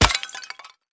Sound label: tile impact bullet; physics; surfaces